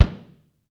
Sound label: DRM JAZZ, Brushes; Roland L-CD701